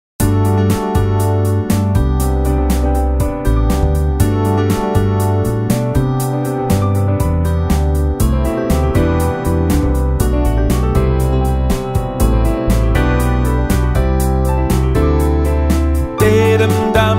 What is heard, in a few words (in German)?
ankommen bari